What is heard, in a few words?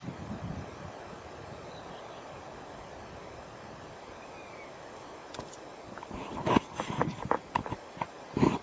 northumberland juv depart